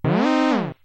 Alarm; MMX

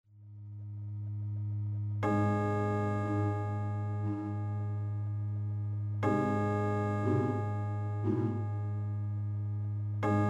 Pre-Boss Ambience; Robo Go

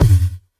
Tom; Ableton Live 12 Lite; Core Library; Drums

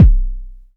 Kick In The Room, Drums

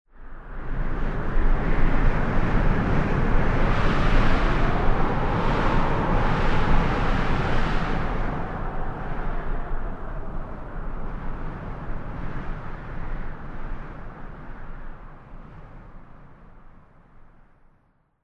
Wind 1shot Quad; Journey

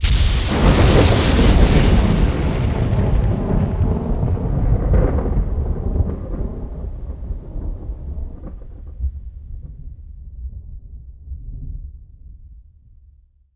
Lightning Thunder 1 shot; Journey

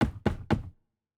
door knock; Alabaster Dawn; crosscode

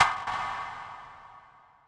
Rim Large Hall, Drums

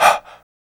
Vocal Chant Breath; One Shots; Ableton Live 12 Lite; Core Library

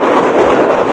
ball roll; There; ThereClient; thereball

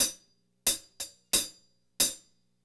90bpm Hi Hat; Sony; ACID Pro 7.0

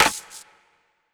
Rim; Drums; Ableton Live 12 Lite Core Library

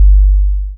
Kick 49 Hz

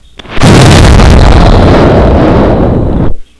big fire; AngelTearsv3; AndromedaSky

